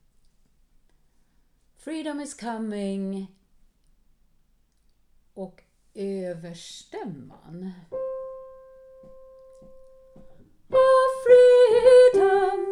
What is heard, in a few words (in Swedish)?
freedom is coming overstämma sopran; alla kan sjunga koren vi sjunger i höst